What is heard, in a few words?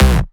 Basses Root C